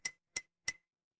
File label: glass glass tap